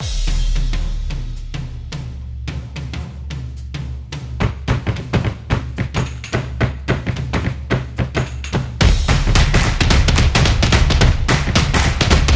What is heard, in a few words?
Jazz Beat; energetic drumming